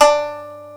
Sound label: D2, ETHNIC; SYAMI-0; AKAI S-Series CD-ROM Sound Library VOL-8; SET#1 ETHNIC